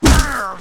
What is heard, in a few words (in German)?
treffer; mount blade